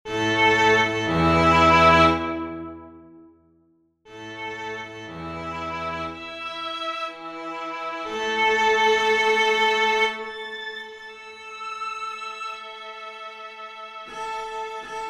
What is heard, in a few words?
String Quartet